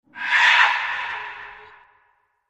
Animal Scream; Eerie High-pitched, With Echo. Use For Monster.; Animal Vehicle Growls